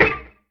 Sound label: ANVILISH, Yamaha DTXpress